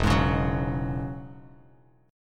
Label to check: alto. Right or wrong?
Wrong